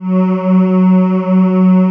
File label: ATMO PADS; Best Service; XX Large